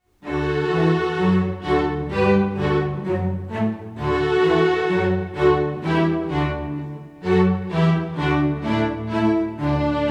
Baroque Strings

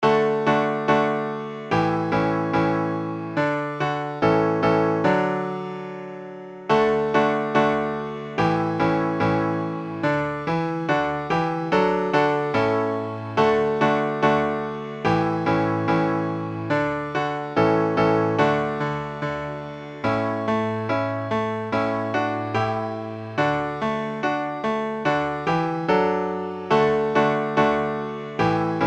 Lightly Row Piano 72 BPM

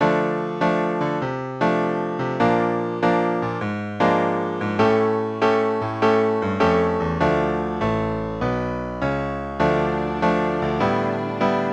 Manhattan Blues 100BPM

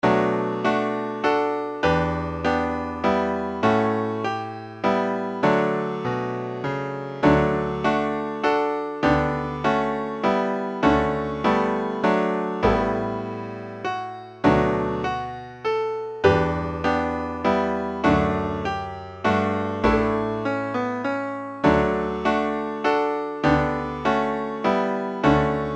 Willow Waltz

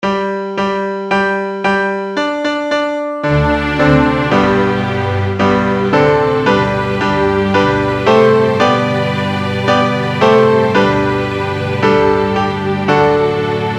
Simple Gifts 6 beat count in 112 bpm

